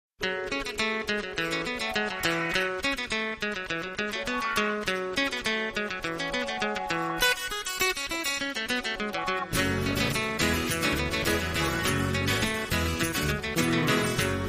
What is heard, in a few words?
Pop Trad